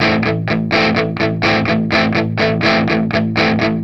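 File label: RHYTHM 125, Vintage Blues Guitar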